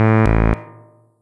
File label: error alarm